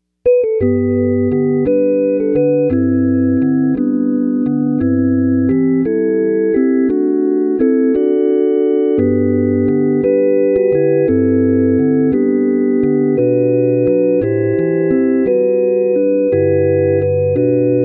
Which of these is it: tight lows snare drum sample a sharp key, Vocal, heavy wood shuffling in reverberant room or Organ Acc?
Organ Acc